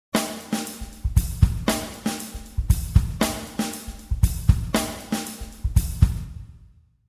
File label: fills